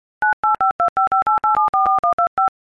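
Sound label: iphone keyboard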